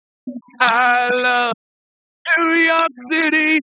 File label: Separated Voiced Mixture m5dB